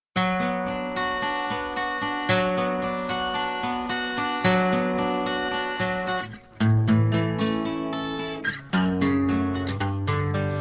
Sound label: love guitar